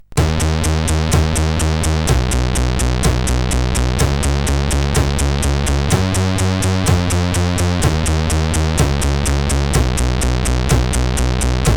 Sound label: Circular Letter tune, CSG8580R5